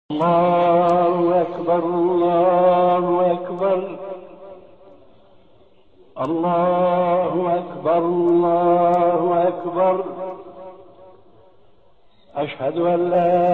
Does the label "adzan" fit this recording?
Yes